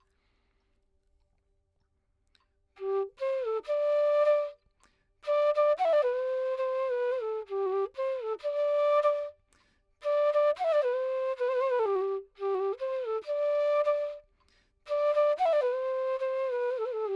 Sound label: Dry 4 4 G 100